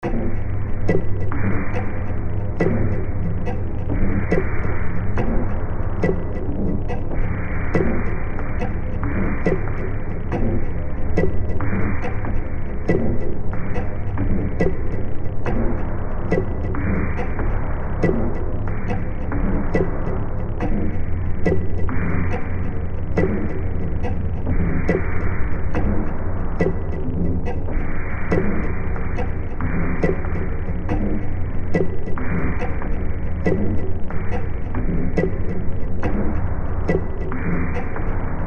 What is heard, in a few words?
clock area